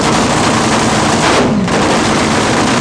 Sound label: NHRA Top Fuel Revving